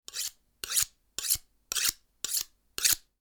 sharpening knife; mechanical

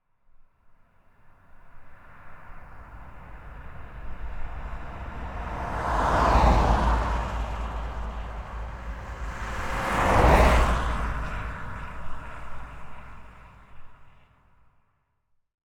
cars passing; transportation

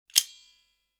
multi plier open; mechanical

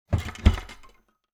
cabinet door close

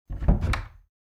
closet door open

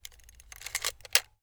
camera winding lever; mechanical

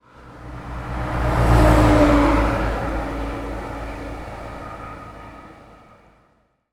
van large pass by; transportation

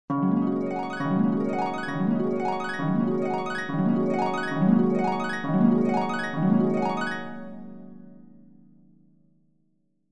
dream harp

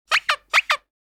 squeeze toy

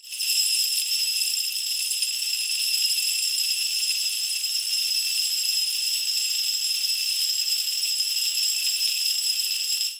jingle bell

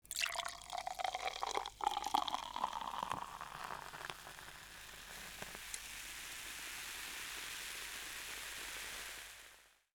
pouring soda; drinks

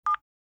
cell phone

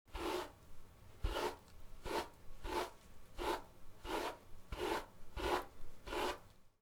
hair brush; human

